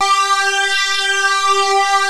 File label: SYN, Analog; Sound & Vision; Gigapack I CD 2